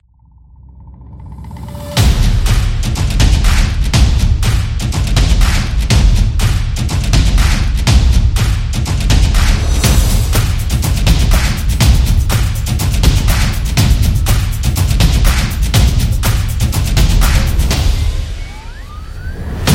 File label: Epic Game; action sport